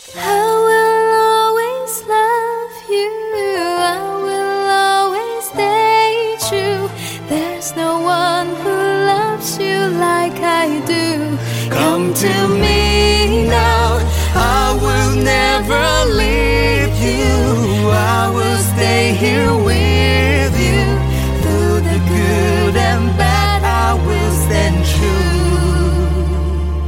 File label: love english